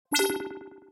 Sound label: Digital Interface